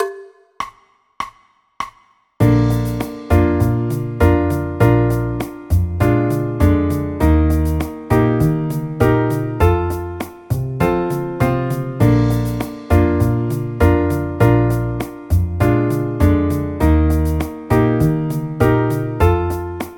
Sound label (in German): bb dur akkordverbindungen